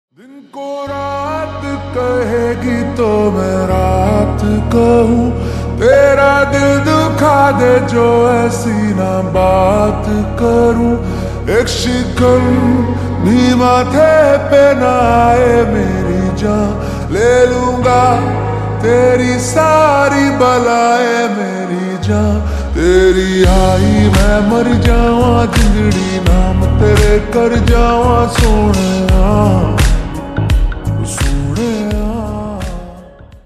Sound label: Slowed Reverb Ringtone